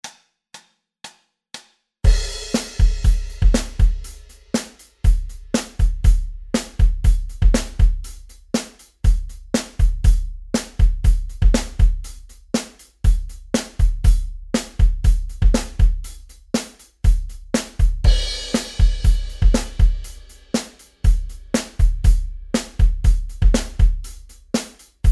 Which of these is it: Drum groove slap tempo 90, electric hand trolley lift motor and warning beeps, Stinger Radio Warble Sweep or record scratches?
Drum groove slap tempo 90